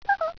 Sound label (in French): coucou; cs rats yo